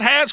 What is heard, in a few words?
STAGE